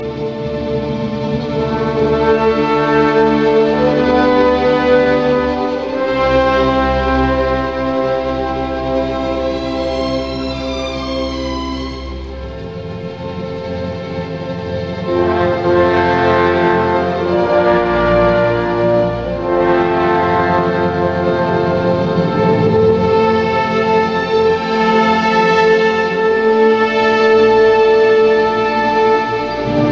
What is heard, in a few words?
Symphony in D minor Langsam Lebhaft; Op 120; revised version 1851